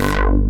VOCO BASS; Pro-Rec - Synthsonic; Z1 DANCE

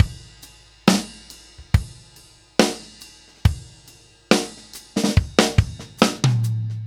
Ballad Loop, Drum, 70s Ballad; Drum Tools

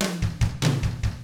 Double Platinum Drums, ROCK 6-8; ILIO; Double Platinum Drums 1

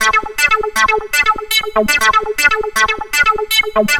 Arpeggiation, EDM; Sonic Foundry (Sony Creative Software); Afterhours EDM; Retro Active

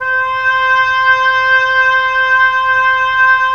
Oboe; Roland - LA Composer vol. 4

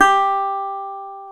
GTR Dobros National; Club-50 - Foundations Roland

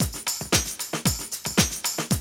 BREAK; Zero G; Man Machine

